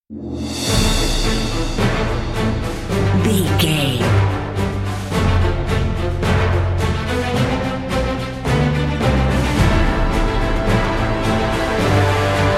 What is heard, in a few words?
march patriots epic cinematic